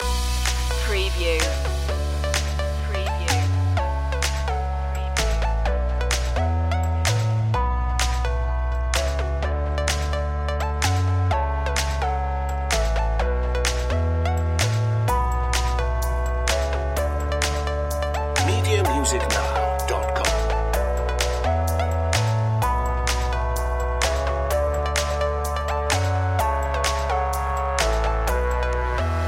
fitness dance loop

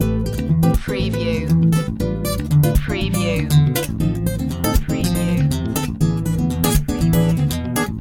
techno samba loop

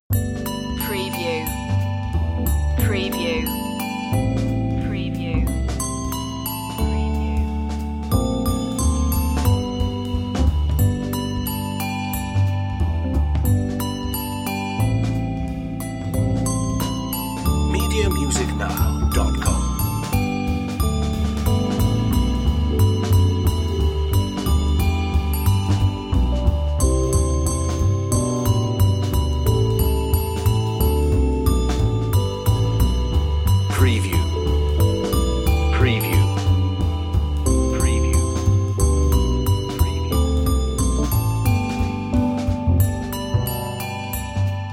jingle bells jazz handbells

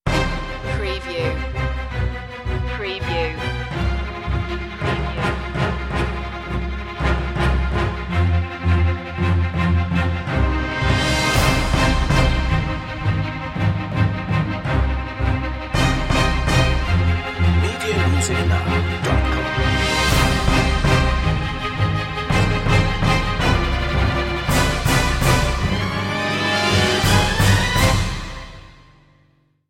no choir

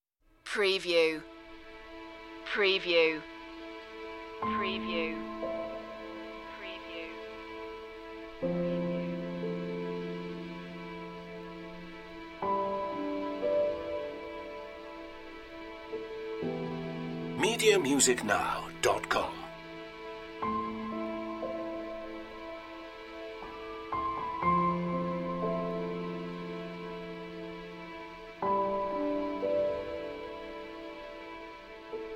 no vocal

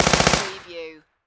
Submachine Gun HK MP5

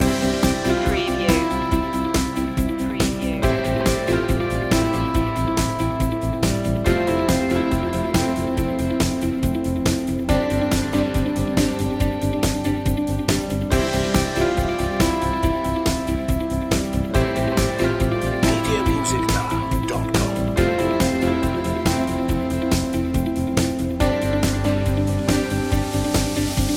new point of view loop

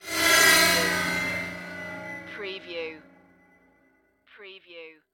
Digital Flange Whoosh; Space / Science Fiction (Sci-FI)

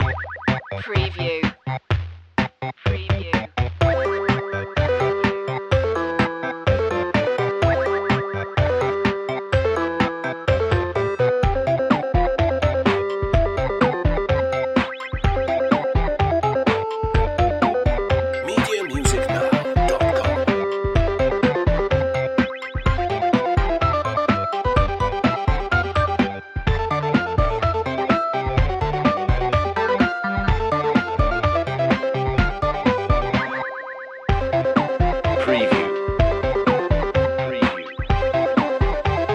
8-bit

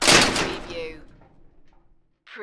Metal Gate or Metal Door Slam

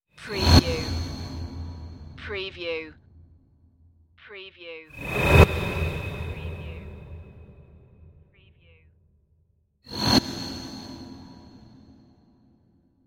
scary whooshes

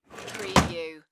Drawer Close; Sound Effects Bible

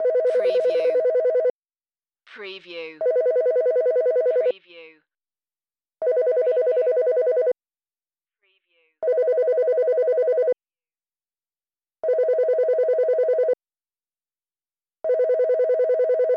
Telephone Ring Office; Sounds of ringing telephones, cell phones, mobiles ringing.

